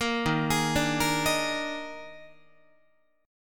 D sharp Major 7th Suspended 4th, D sharp, down, Guitar; arpeggiated